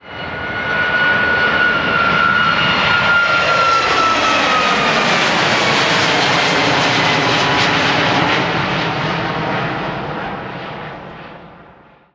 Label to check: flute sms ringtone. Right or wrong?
Wrong